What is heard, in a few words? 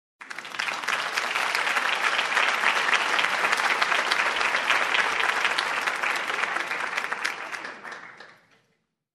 Audience Warm Applause